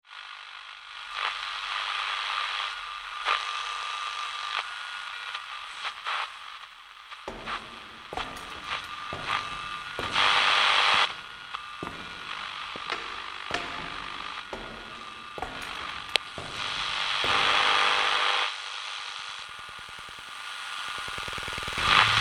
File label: Noise; STEFAN RÖMER